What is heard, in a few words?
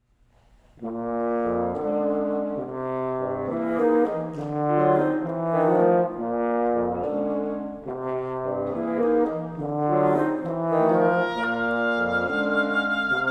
blues